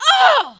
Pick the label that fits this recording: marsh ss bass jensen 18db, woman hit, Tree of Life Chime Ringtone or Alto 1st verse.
woman hit